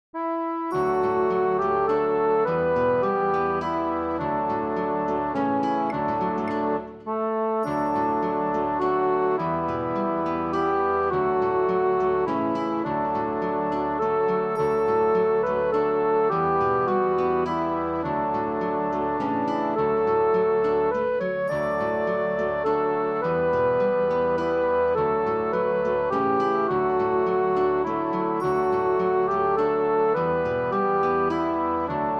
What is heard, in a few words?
Doxology Round (backing); melody 2016; New Hope Music